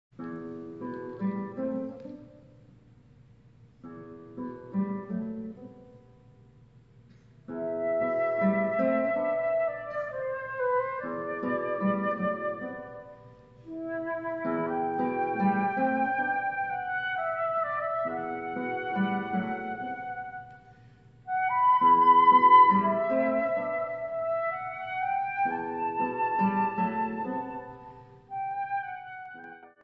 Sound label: Flute Guitar